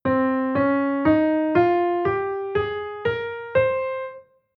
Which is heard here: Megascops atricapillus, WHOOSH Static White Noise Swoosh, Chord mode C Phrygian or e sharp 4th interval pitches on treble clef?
Chord mode C Phrygian